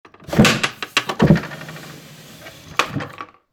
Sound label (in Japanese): 日本の民芸箪笥タンスの音; chest